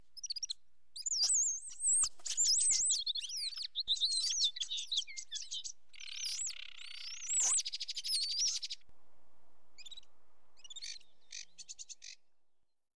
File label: Birds Sing